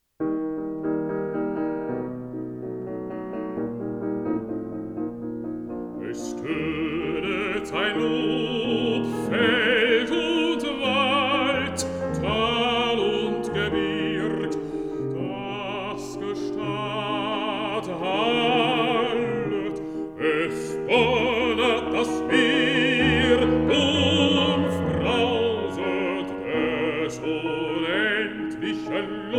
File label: song for voice & piano